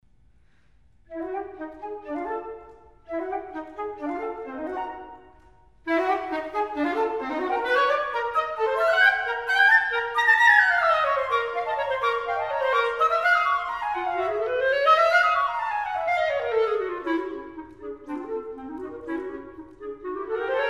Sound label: Duos for Flute and Clarinet, Allegro ma non troppo